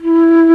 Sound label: TRON FLUTE; E-MU Formula 4000 Series Vol. 5; Protozoa